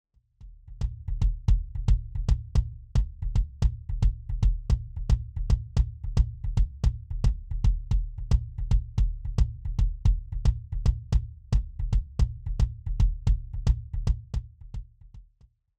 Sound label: Kick RAW